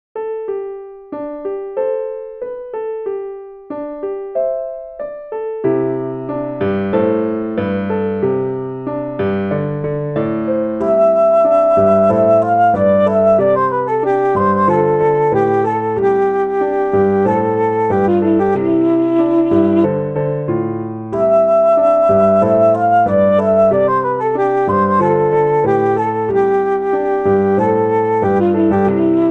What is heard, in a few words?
relaxing